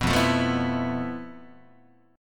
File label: F Major 7th Flat 5th, Guitar; strummed; JGuitar